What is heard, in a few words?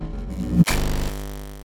charge item